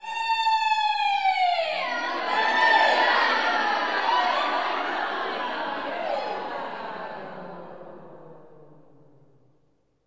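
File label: Slavic Choir Shriek Chatter Fade; multisampled; vocal; Slavic Maybe Bulgarian Choir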